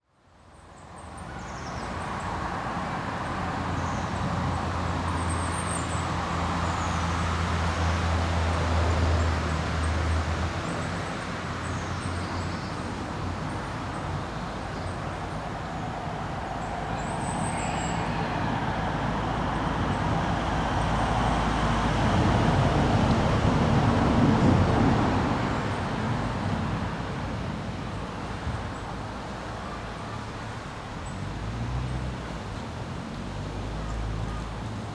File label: Sneeze in the Park